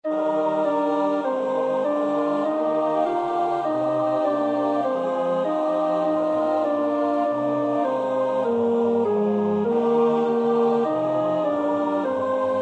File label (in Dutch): (alt), Oefenbestanden; Nieuw Liedboek; NLB 556 Alles wat over ons geschreven is